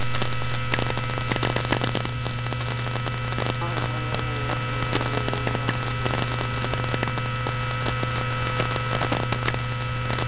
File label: bark